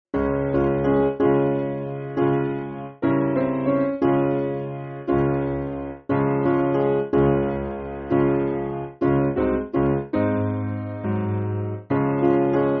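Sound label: Piano, Music Backing Files for Churches; O To Be Like Thee; Rondinella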